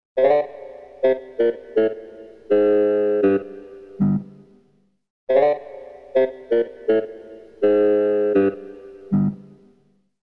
detektivnaja istorija

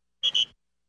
korotkij dvojnoj svistok; Звуки футбола; Звуки игры в футбол

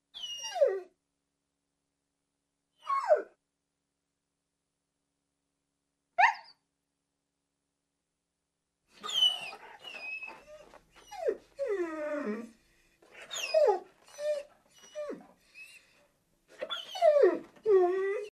zhalobno skulit i tjavkaet

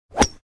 udar po mjachu v golfe so svistom

